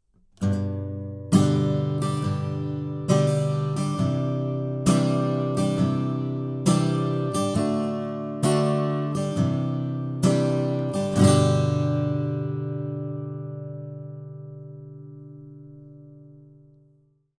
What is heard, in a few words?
Acc Guitar - C12 Bi Direct - Edge modelled; bidirectional (osmička); edge duo srovnavaci test